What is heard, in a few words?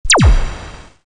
player shot